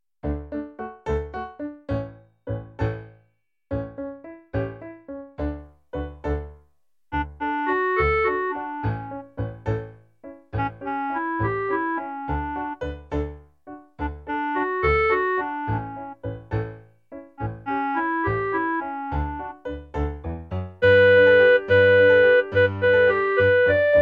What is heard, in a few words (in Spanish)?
CLARINETE; Chiapanecas